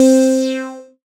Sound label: PLK C4, Yamaha CS40M synth; Leads and Keys